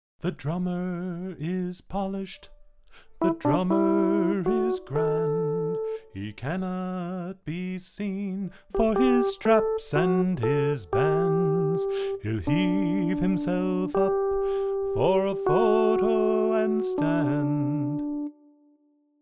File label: Alto